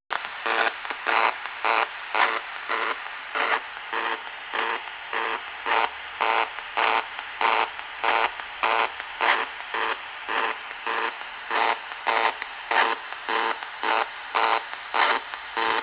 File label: 49950kHz wfm